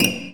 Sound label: whistle stop sting; Cinematic Metal Impacts